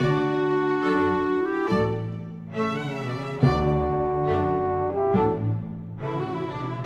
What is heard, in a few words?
Classical Orchestra; Sonic Foundry; Orchestral